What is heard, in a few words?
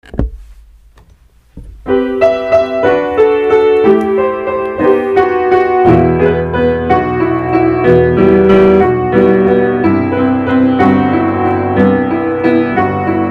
piano